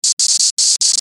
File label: Stutter Smooth Bright Static; Stutter Digital Distortion; Blastwave; Production Element Imaging Elements Accent Transition